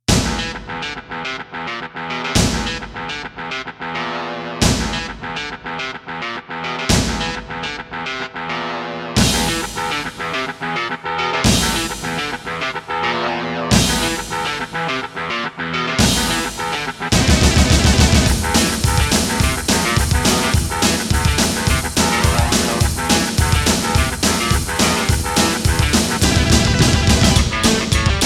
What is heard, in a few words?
CM-64; Doom e1m1